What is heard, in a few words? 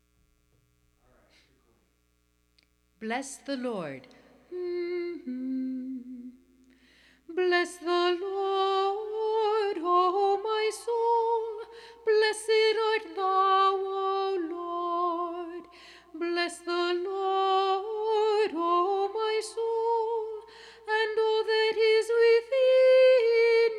first antiphon soprano, choir